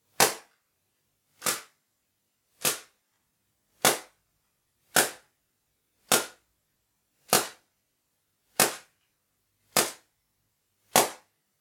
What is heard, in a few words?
kamideppo half delay